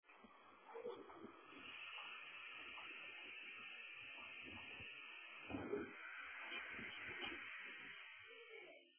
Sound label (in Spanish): Lechuza de Campanario